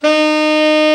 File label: BRA TENOR, SAX; Best Service Gigapack 1; BR SAX 2